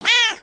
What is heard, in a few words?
Pet Cat Hurt; creatures pets